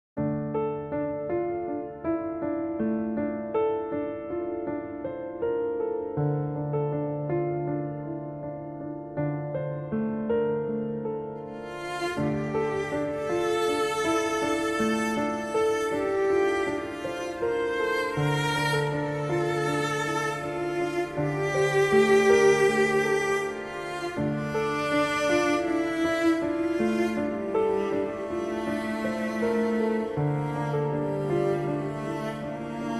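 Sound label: Windy Night, sad violin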